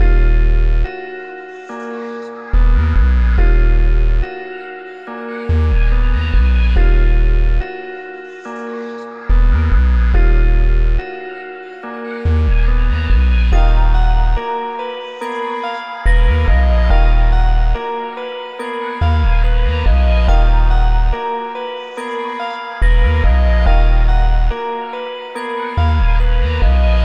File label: 142 BPM D# Min, drill hip hop melodies; Can't Die